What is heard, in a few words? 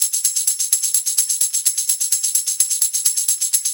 Shaker Loop, 128 BPM; Vengeance Samples